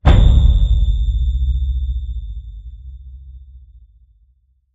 Ringing Light Hit

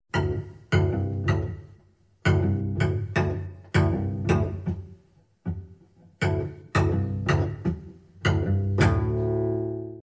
Cello Suite 2 Pizzicato Only

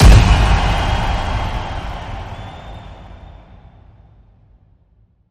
Crowd Cheer Smack Hit

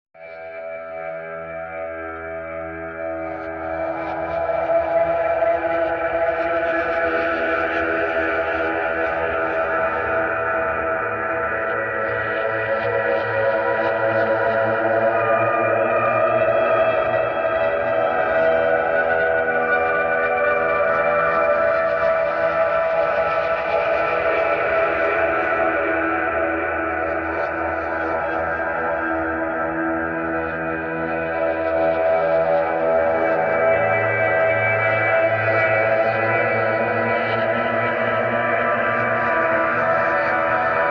Basic Mix

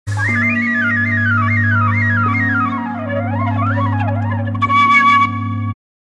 Ethno Travel

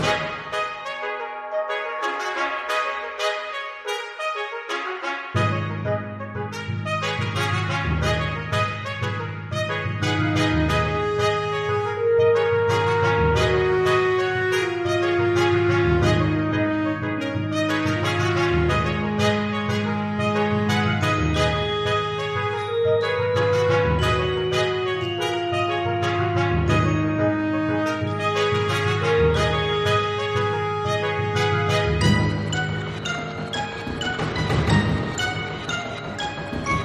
Brass & Perc

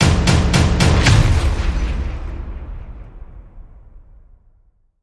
Hit Pounder

